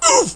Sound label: bump; homersimpson